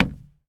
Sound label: wood footstep